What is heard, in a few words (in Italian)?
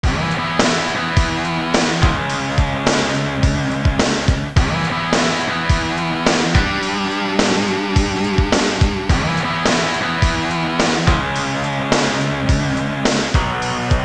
suonerie musica rock